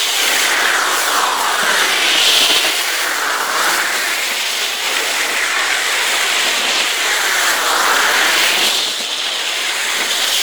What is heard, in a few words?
Light Beam Loop; Warframe; Eximus; JadeLight